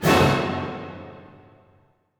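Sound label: orchestra; Masterbits - Switch FX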